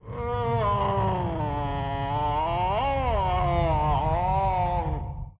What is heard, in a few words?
groan; Mommy